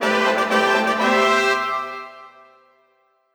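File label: Fanfare Continue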